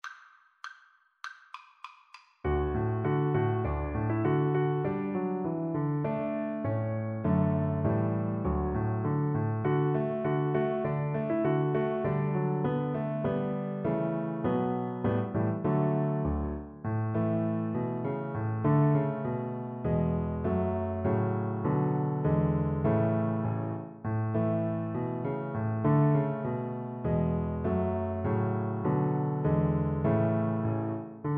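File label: viola karaoke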